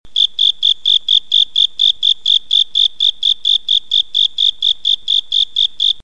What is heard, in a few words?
Crickert Call